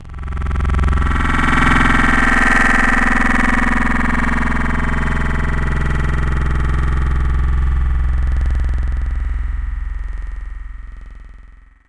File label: growl reved up; Synthetic's Serum Collection; Echo Sound Works Core Tables